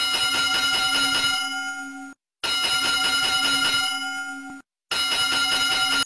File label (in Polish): dzwoneczek, dzwonić